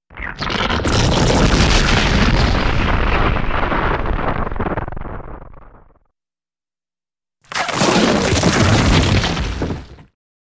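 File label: Explosive Rock Break; rocks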